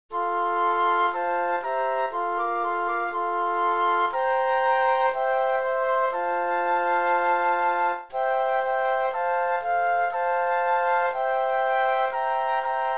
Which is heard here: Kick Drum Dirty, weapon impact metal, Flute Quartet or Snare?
Flute Quartet